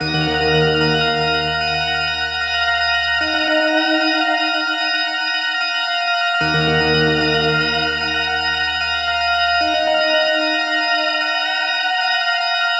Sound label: Kit Keys D#m 150, Trap; HipHop; Melody Kits; Loops; Ghosthack; Creeps 150 D#m